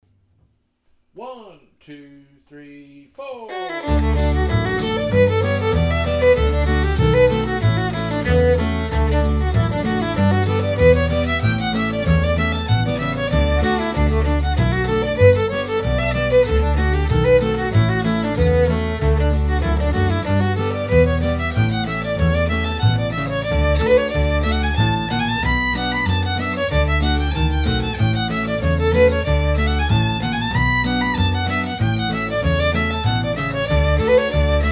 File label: Fiddle